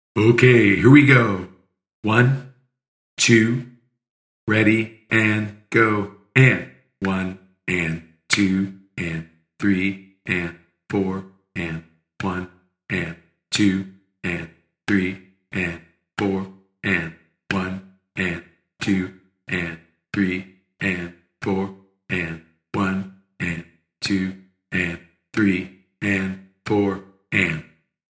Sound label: rhythm clapping